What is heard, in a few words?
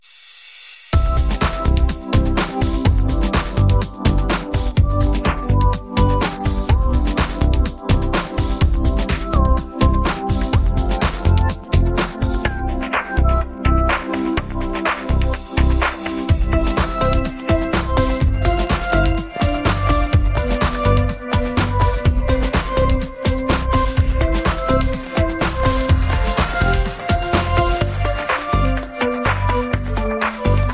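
backing track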